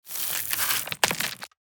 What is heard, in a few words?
Small stones and gravel; rocks